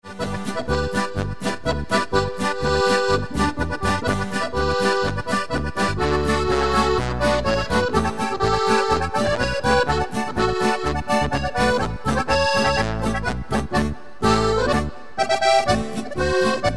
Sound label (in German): Feuerwehr Polka Akk